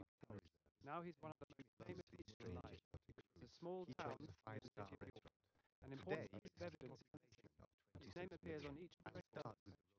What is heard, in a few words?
male; SiSEC11; underdetermined